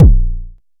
analog tonal, kick; Ableton Foundation FreePacks

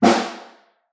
SN Far, Snare; Ableton Foundation FreePacks; Snare Designer

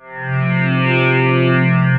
Movement Protocol Pad, Ambient & Evolving; Wavetable; Ableton; Factory Packs; Synth Essentials

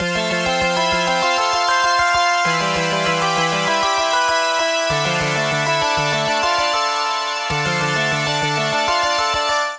Naked Keys C Major 97 bpm; Accompaniment; Tonal; MIDI Clips; Drive and Glow; Ableton; Factory Packs

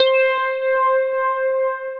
Sonix Pad Weesen, Synth Pad

